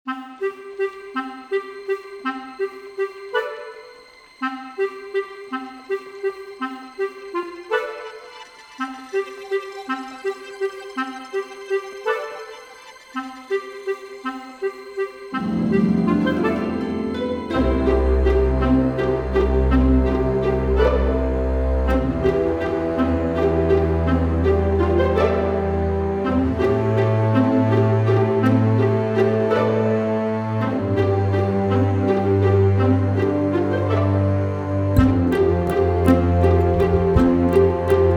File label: stringey